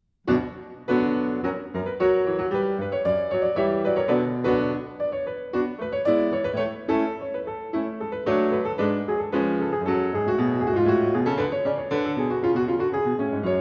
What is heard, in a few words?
French Overture In B Minor